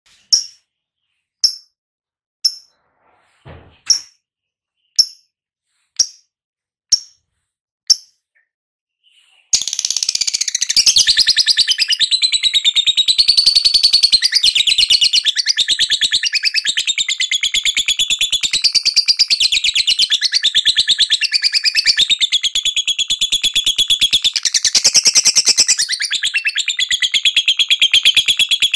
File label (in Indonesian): suara burung lovebird ngekek auto gacor